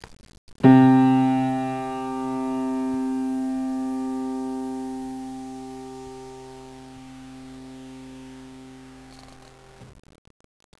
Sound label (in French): piano do3